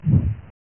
Move (Giant Wasp - Couatl); Dungeon Master and Chaos Strikes Back; Amiga

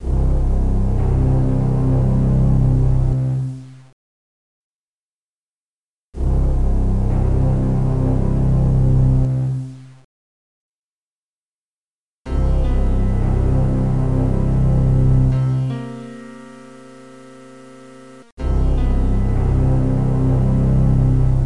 Music; Dungeon Master II; Macintosh

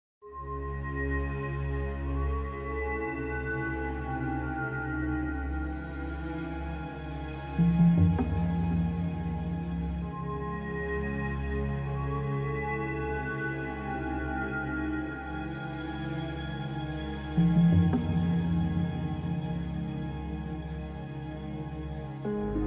Music; Dungeon Master Nexus; Sega Saturn

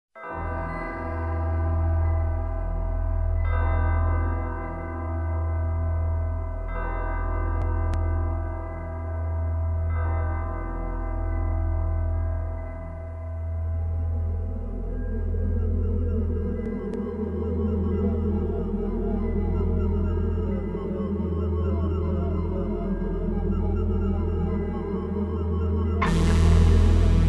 Music; Dungeon Master II; PC-9821

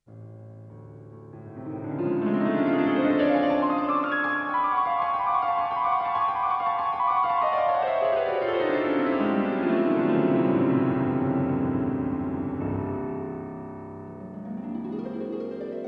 Music, PC Engine; Theron's Quest (Japan, PC Engine) - Track 04

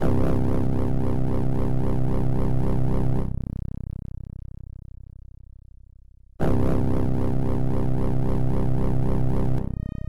Music; Dungeon Master II

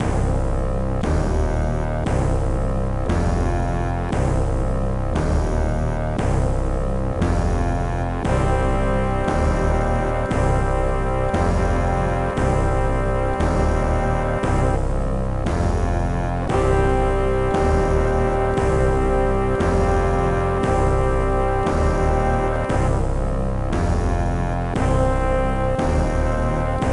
Music; Dungeon Master II; Macintosh

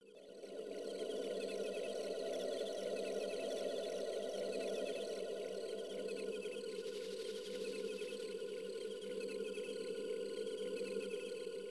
Music; Dungeon Master II; Amiga

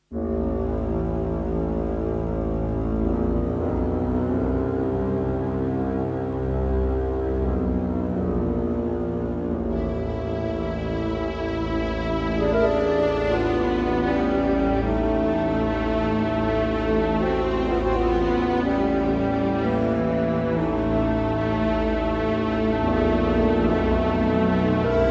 Music; Theron's Quest; PC Engine

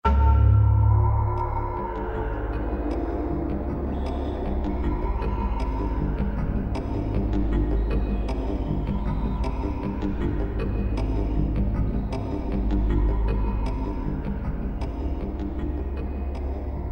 Music; Dungeon Master Nexus; Sega Saturn

